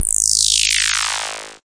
electro perc; Hip Hop; Precise Electro Percussion; style kits

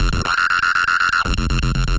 120 aggressive mono synth

